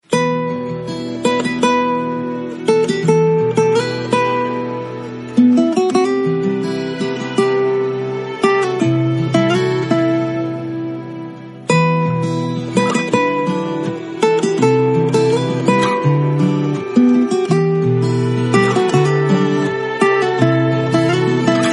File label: Bollywood Ringtone